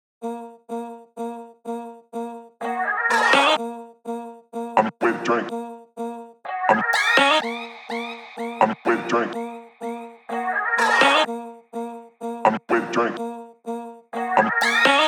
125 BPM Vocals, D# Min; Melody Loops; Loop Stems; Cymatics; Fever Dream

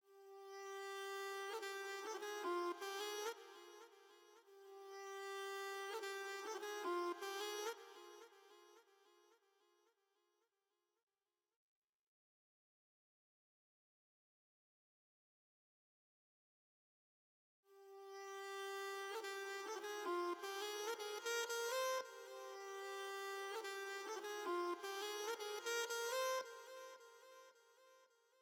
109 BPM C Min Flute; Cymatics; Mummy; PHARAOH

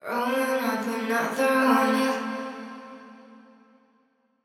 110bpm F, Vocals; Vocalpack

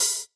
Open Hat; Cymatics; EDM Starter Pack; Drums - One Shots; Cymbals

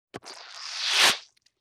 Wet Squoosh, Vintage Cartoon

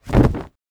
Short foley

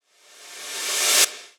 Suckback SFX; Material; GhostHack; Drum Mega Bundle; Abstract Percussions Volume 4

